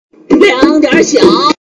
sound water compare point

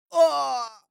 Death

